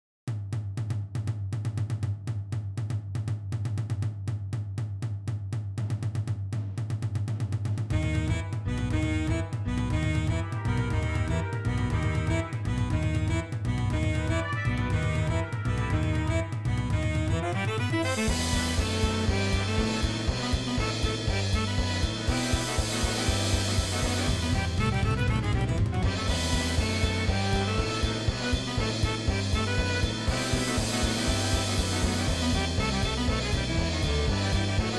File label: von meinem Dorico 5 abgespielt